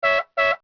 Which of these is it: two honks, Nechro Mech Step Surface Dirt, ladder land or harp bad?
two honks